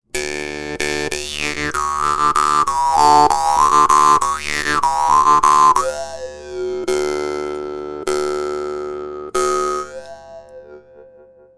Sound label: doromb khan